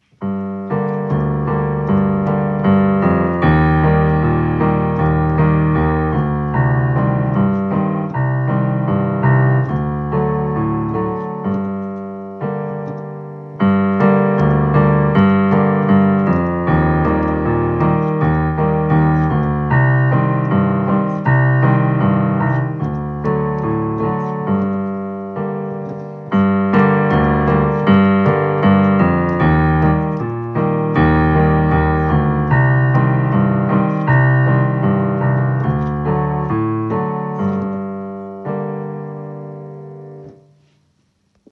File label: Clarinet accompaniment